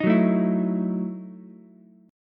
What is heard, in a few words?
E Major 7th Suspended 2nd Suspended 4th, Ukulele; EM7sus2sus4 strummed